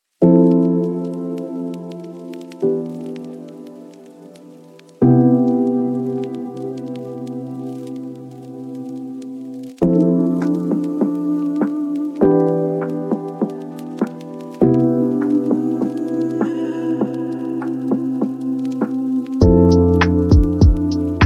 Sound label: ai lofi